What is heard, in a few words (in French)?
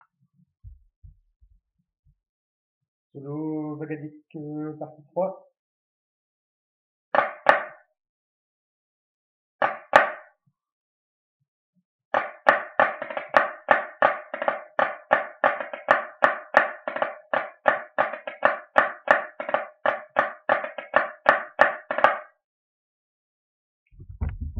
SOLO BAGADIG